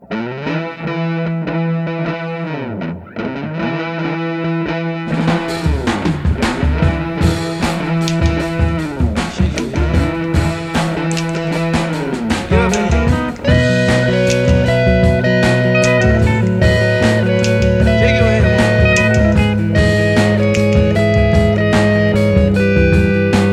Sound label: Elec Beam; nintendo